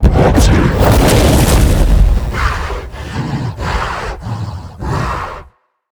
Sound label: Boss Hands Hit; helltaker